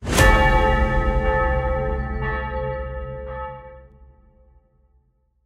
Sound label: Death